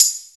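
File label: TAMB, tambourine; East West - Phat & Phunky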